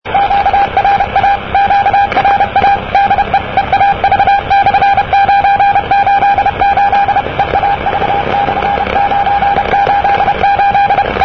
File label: cw